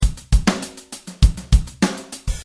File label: 16th funky drum groove